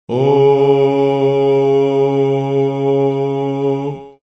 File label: low voices male, Male Oh